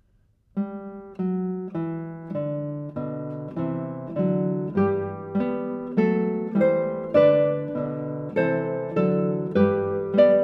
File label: Bach Canon Triplex a 6